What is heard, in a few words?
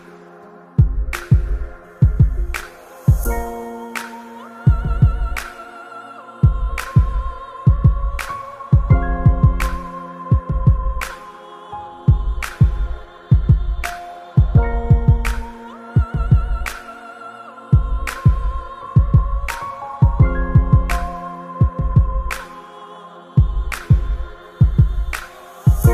dirty beats clouds